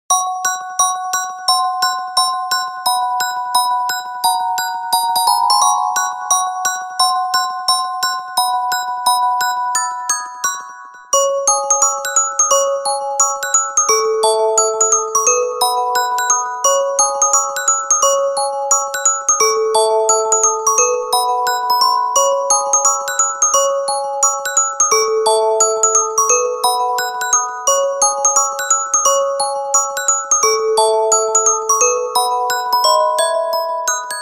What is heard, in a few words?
orgel; 洞窟物語